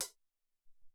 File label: SR16 Hat, Alesis SR-18